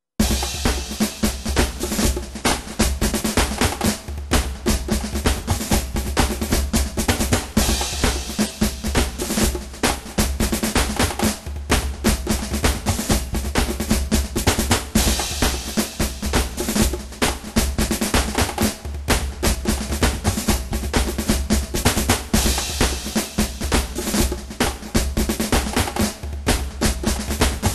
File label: Drum Drill